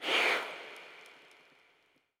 Breath

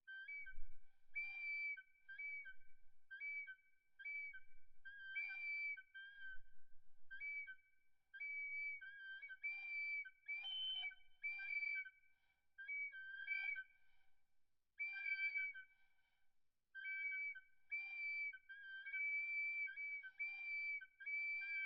Fish Eagle Call

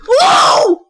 Wooh

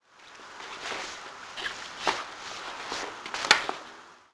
Bag

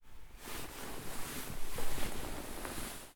Blanket Movement

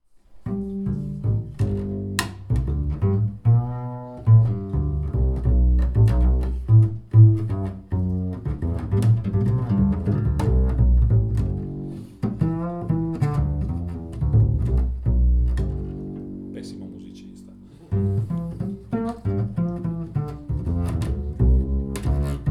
Double Bass Test

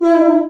Open Cuica

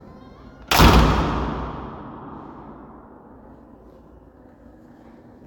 Big Door Slam

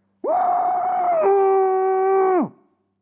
Person Screaming Into Towel In Large Bathroom

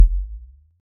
Trap

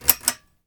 Pull chain fast off

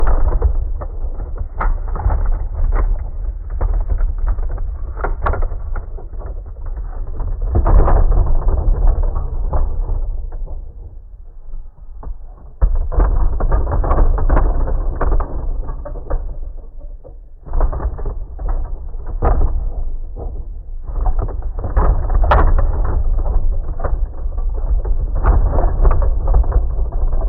Falling Great Wall

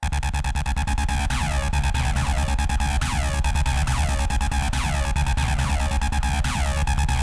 140 bmp 303 squeal

